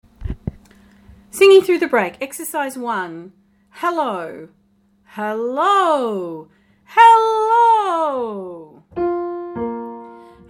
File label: singing through the break